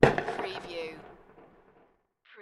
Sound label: Firework bang; beanstalk audio